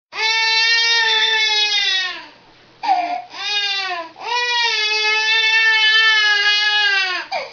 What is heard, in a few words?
Baby Crying